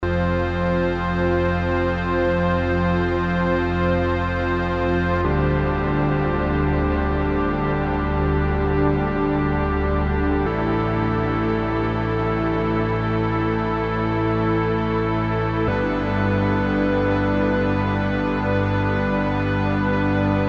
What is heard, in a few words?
prophet pad; morphoice